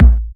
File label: Soft Kick; Vengeance Samples